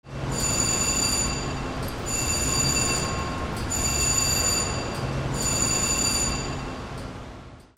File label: BELLS